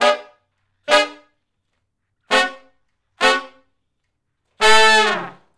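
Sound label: R&B Riff V. IV, I (A) harmony, Horncraft for R&B; Crimson Blue and Fabulous Horncraft 4 RnB; Sections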